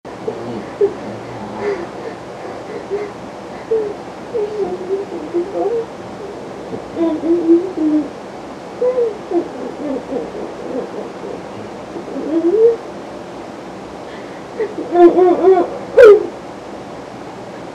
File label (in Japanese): えち音声